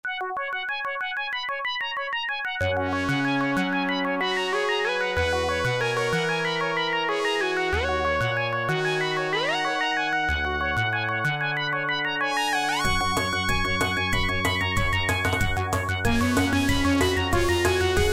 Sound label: turbo sound fm cover